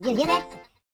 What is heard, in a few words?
YODEL YELL, World Voices